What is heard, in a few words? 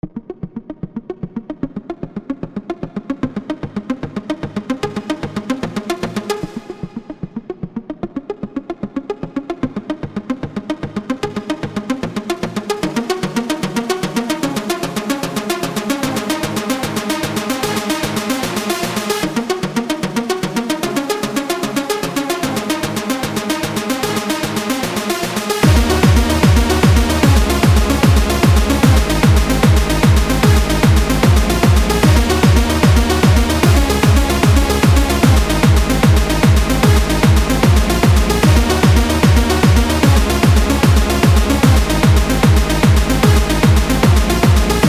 trancy hardstyle